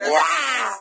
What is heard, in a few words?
wahhh